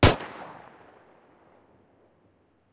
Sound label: deagle; weapon